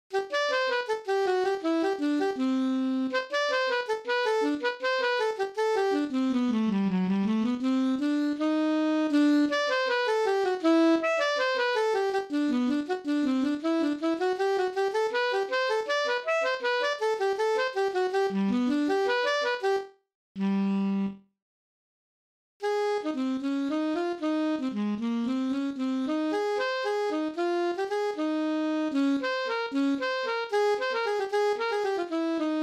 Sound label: alto